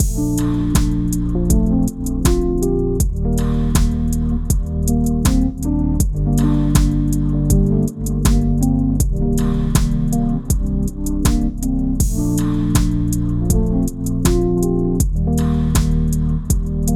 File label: instrumental